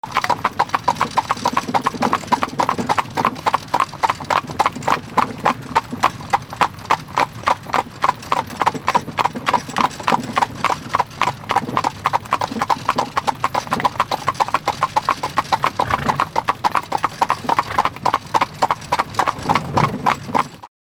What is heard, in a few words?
horse carriage on gravel